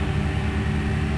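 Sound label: door cargo Bay loop; doors elevators; Star Wars Obi-Wan; XBOX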